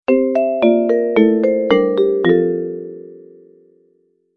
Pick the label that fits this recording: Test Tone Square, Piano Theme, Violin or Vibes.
Vibes